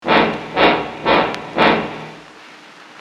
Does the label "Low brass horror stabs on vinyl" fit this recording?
Yes